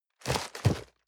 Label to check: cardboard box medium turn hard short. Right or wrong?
Right